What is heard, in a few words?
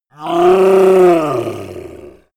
yeti growl struggle